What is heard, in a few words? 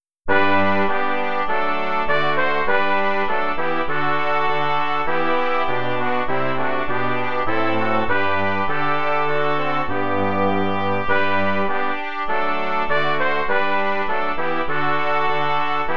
Dearest Jesus Brass